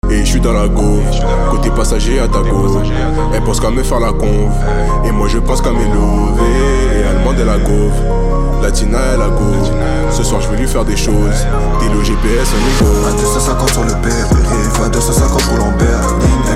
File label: MIDI